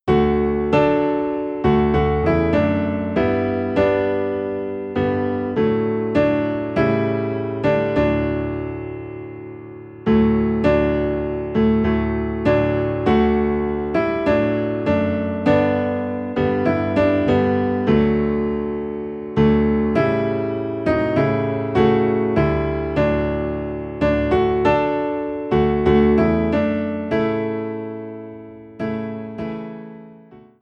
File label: Piano Low in D; I Love to Tell the Story